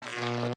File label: electric large inventory move; factorio 2.0.55